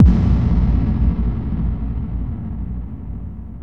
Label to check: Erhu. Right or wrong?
Wrong